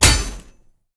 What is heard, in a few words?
Impact; smite; god susano